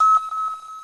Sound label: alarm; pesterchum; paperchum